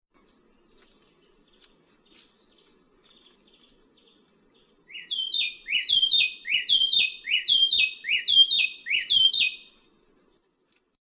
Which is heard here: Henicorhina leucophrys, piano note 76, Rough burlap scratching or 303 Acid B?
Henicorhina leucophrys